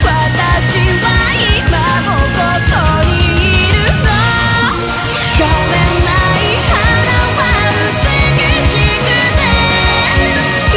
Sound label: ringtone; claymore ed